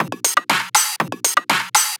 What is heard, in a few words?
Filtered Beat, Trance; Sonic Foundry (Sony Creative Software); Trance NRG VOL-2; Drums